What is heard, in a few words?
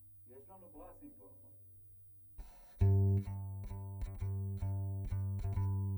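Contrabass DI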